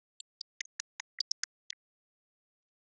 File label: denoising glock 0dB mix